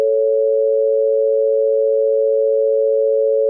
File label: notch filter